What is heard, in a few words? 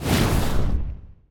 dragon wing